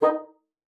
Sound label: D#4, bassoon; Xsample VOL-6